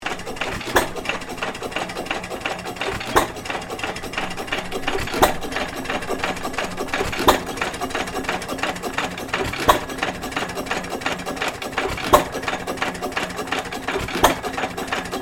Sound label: Engine Sandwich Engine 2.5hp operating, Classic industrial machinery; 1922; Motors; Machines